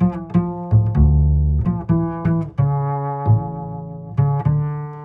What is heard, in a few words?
bass, Loops